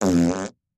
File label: Dry Fart; farts